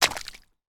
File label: human footsteps jump into muddy puddle; sound effects one